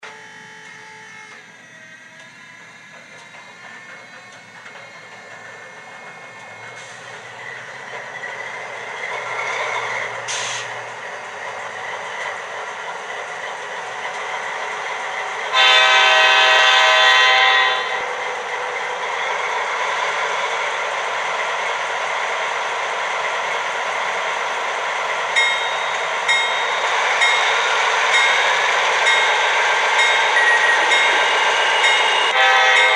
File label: GE DASH9, electric trains